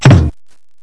POCK; psion cd vol1

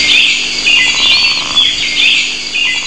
JUNGLE; psion cd vol1

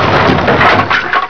CRASH

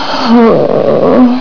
GROAN; psion cd vol1

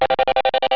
buzz

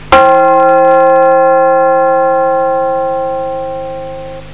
DONG

